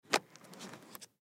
Nissan Altima 2008 Visor Lower Interior; Transportation Automobile; Car

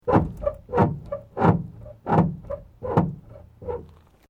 Metal Light Movement Minor

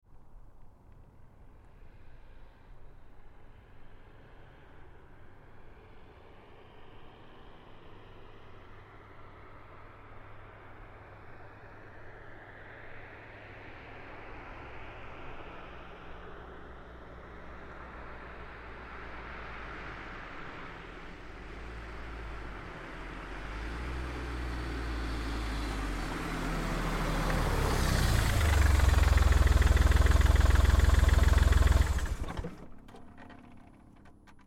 Car Smart Car Up Stop Med; 2005 Smartcar Fortwo; Vehicles; Big Room Sound

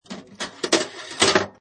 Pinball Sound Ball Move On Rail And Drop; Video Games

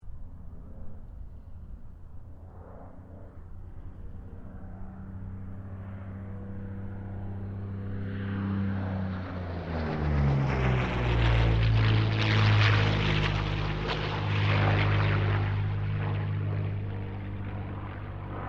AIRCRAFT P-40 KITTYHAWK PASS STEREO

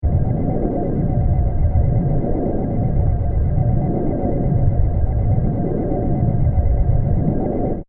science fiction ufo hover; Ufo Hovering Overhead; Spacecrafts

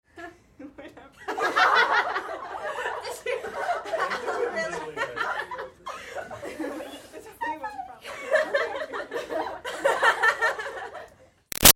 Crowd Teens Girls Party Laugh Giggles; Crowds (Sound Effects:Backgrounds); Big Room Sound

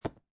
Poker Chip Dropping On Felt; Poker, gambling; Cards; Casinos; LynneMusic

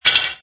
Metal Plate rattle; Metallic; Lynne Music

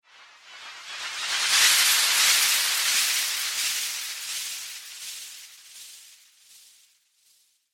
Spell Eternal Spin, Magic Sound Effects

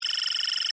Data Text Typing, Beep, Readout, Scifi; Tech

